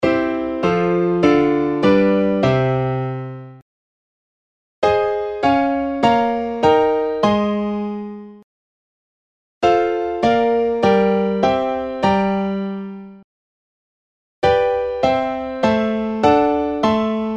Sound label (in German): Sequenz